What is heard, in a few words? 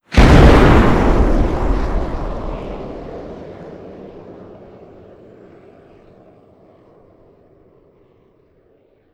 flyover boom